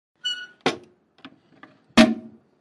HOUSEHOLD TOILET: INT: Seat down & up, squeaky seat with toilet resonance.